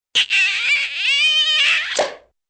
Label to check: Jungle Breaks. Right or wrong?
Wrong